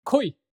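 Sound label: Koi, battlecries; 来い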